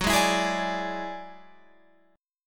F sharp 7th Suspended 2nd Sharp 5th, Guitar, Open F; F#7sus2#5 strummed